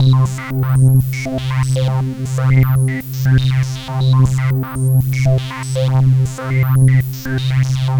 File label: Synth; Sonic Foundry (Sony Creative Software); Prototechno; Synths